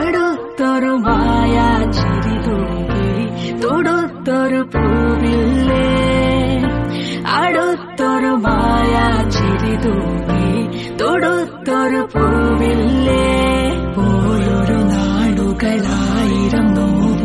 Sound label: Malayalam Ringtone